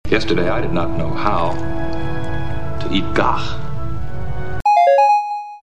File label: eating; riker; gagh; svencoop; keenhalloween